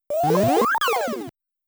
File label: Jump Sound; Motos